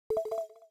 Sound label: cursor click; PremiumBeat SFX